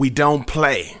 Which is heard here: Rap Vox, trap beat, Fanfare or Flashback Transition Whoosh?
Rap Vox